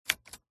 erika typewriter single strike; PMSFX

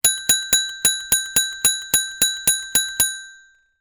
transport bicycle bell ring several times

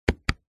technology mixing desk digital button press

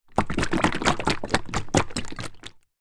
food cooking oil shake in plastic bottle; zapsplat

